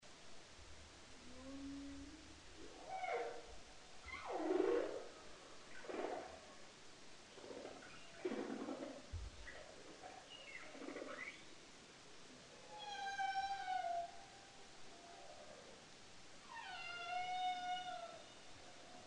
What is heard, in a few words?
humpback, Bering Strait; Humpback Whale; sounds arctic ocean